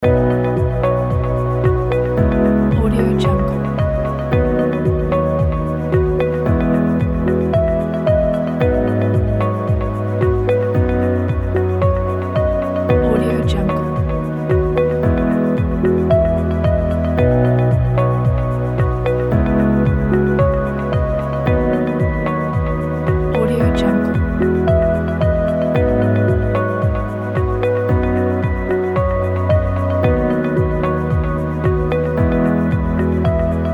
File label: calm ambient floating loop